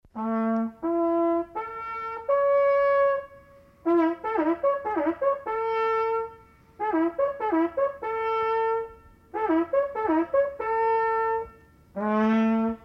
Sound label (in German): Horn